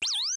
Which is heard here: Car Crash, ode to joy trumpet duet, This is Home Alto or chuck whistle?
chuck whistle